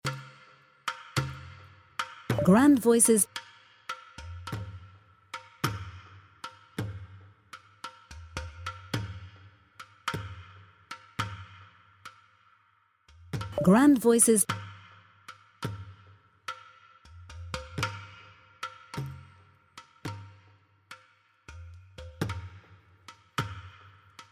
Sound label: MUSIC RELAX